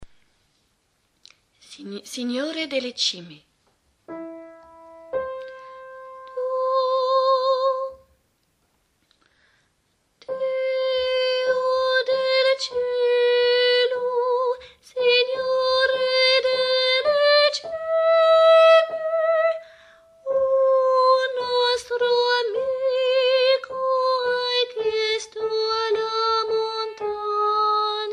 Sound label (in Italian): tenor